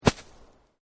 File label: snow impact; holidays; snowbrawl